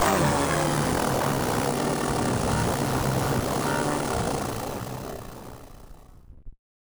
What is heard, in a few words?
Malfunction Synth, malfunctioning synth samples